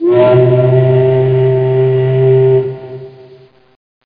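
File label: ship horn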